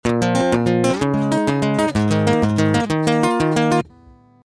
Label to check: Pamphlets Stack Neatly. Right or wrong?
Wrong